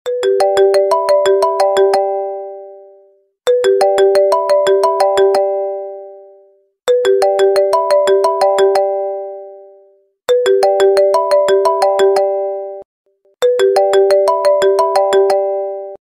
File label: Apple Ringtone